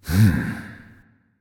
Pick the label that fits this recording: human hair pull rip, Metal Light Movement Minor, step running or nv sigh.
nv sigh